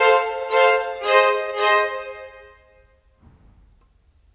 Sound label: Violins; Sonic Foundry (Sony Creative Software); Orchestral Series VOL-4; Rock and Pop; Rock-Pop 13